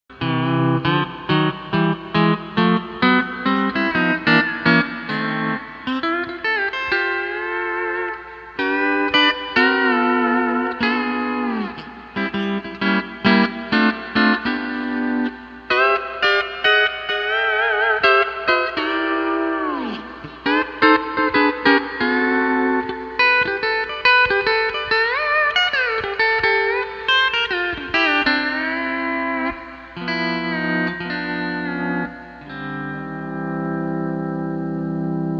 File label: E 9th Med 5 Swing